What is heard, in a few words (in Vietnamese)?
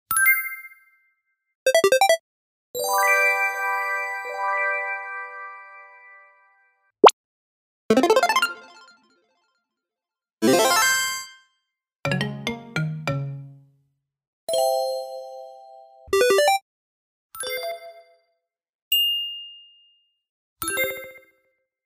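Âm thanh chiến thắng trong trò chơi điện tử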